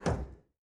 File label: stick roll lim, aircraft; DCS